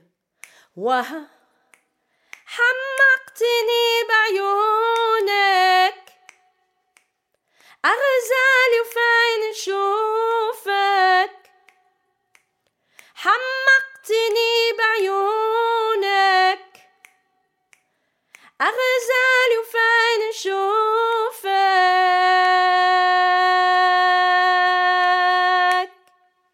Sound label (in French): FARAHTSINI REPONSE REFRAIN VOIX 2